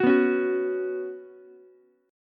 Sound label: B Suspended 2nd B down Ukulele; strummed; JGuitar